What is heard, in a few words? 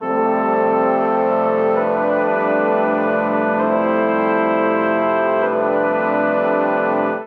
Phrase A Minor 132 bpm, Brass Quartet; MIDI Clips; The Citadel VI-iv; Ableton; Spitfire Audio